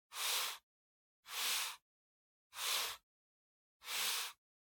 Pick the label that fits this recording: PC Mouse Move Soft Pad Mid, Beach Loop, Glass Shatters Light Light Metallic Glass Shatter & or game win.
PC Mouse Move Soft Pad Mid